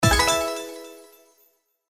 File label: Win Low; slots; Thanksgiving Blast